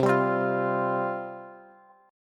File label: C Suspended 4th C, Bass; Csus4 strummed; JGuitar